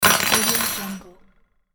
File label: spoons drop